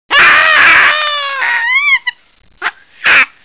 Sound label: baboon; animals; wildlife; city library